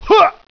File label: pain